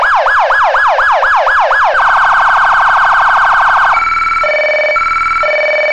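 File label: emergency vehicle scan, sirens; federal sig tm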